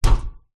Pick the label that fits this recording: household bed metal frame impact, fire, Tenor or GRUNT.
household bed metal frame impact